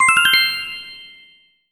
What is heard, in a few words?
egg; +1 egg found (easter event)